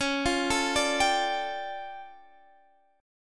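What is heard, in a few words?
D Minor Major 11th, D flat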